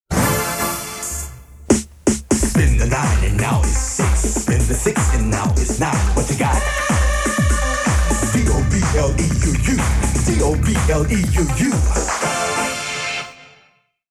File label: Jingle